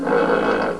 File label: rumble